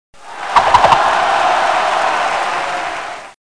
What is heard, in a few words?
hat spin; PUZMANIA